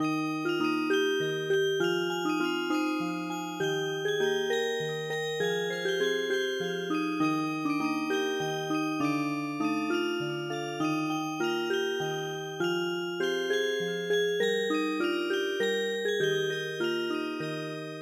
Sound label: Music Box; Where Are You Going To My Pretty Maid